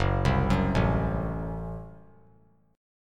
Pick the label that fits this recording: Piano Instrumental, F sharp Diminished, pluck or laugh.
F sharp Diminished